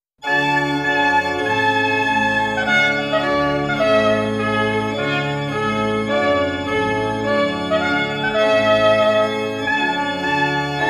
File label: trumpet tune